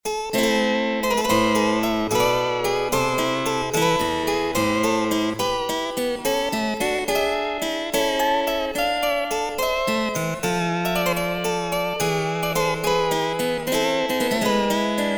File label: harp wow & flutter; antiquing